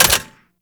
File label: Sniper Mech React; DE; Warframe; Warframes; Frumentarius; ExaltedSniper